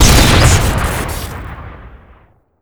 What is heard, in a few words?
blast shotgun shoot crit